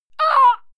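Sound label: female fight pain, screams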